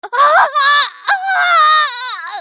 スカウト女 死亡

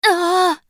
ダウン; カルヤライネン

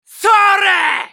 攻撃; ソホ(格闘型)

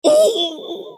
ダウン; ジャック

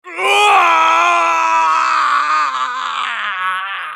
男･死亡; ウォーロック

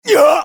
ダウン; ナル

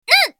被ダメージ; ナタリー

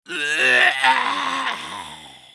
死亡; グルトルデ

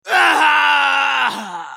死亡; ソホ(格闘型)

